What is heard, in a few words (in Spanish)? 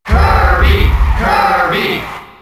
Grito del público; Kirby; SSBM